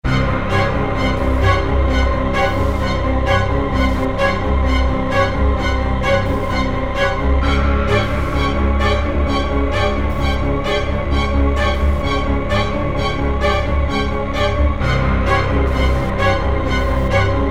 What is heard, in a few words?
horror, 130 BPM intro; アドベンチャーゲームやノベルゲーム; Adventure Game Music Pack